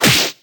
Punch short; Fight Knight

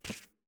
Walk; portal quest android; Antihero

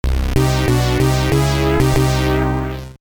Game Over (Techno Party); apidya; amiga

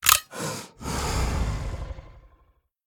Gunshot Warning Idle; The Town

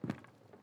Step; Rebel Cops

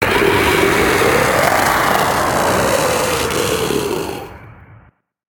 butchered death; Fight Knight

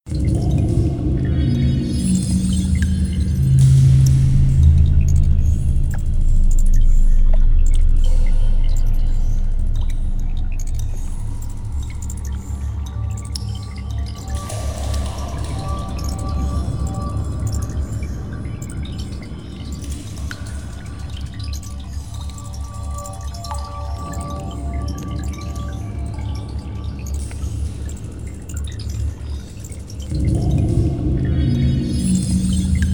Caves and Machinery, Ambient; rayman 2 remastered soundtrack

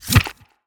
Impact; portal quest; android; Rebel Skill2

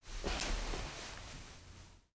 Sitdown

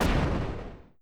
EXPLOSION Medium Bright Impact Rumble Quick Tail; adams venture origins

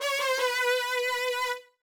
Failing; Imi made Wakaru Otona no Jukugo Renshuu Kadokawa Ruigo Shinjiten kara 5-man Mon; 2009 nds gamerip

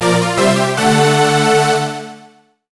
Jingle Win; Wii Chess

